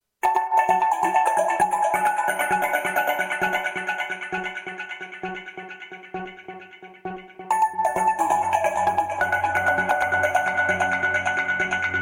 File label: Cool Sms